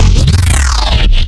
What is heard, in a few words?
LFO bass drop; neuro; BIG5AUDIO